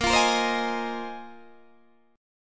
A sharp 7th Suspended 2nd Suspended 4th, down, Guitar, Open D